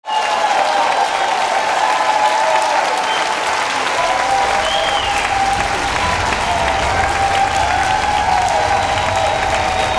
APPLAUSE; Best Service; World Colours

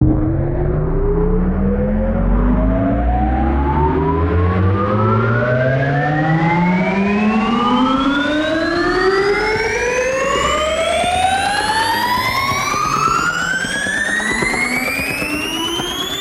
Rises; neuro; Optiv & BTK

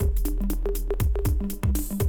120, Classic Drum Loops

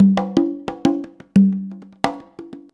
CONGA, Modern Percussion Loops

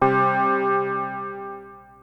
PPG C3, synthesizer; Hollywood Edge; Giorgio Moroder Rare Synthesizer Collection; PPG.2.3

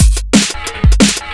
Speed Loop, Drums Beats

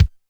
Kick, TR626

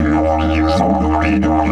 EF 140, DIDGE RIFFS; Big Fish Audio - Didgeridoo and Other Primitive Instruments

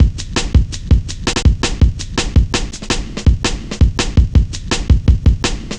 Cut Thru B, Drum Loops, Jungle, 165.5

